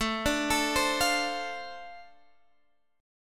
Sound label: D-Minor 7th A, chord